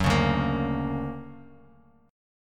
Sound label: D Augmented Major 7th down Bass Standard